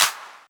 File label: Clap; Squid Beatz; Splatoon 2